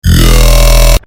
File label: dubstep noise